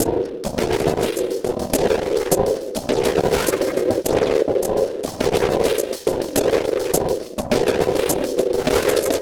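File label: Loop, beats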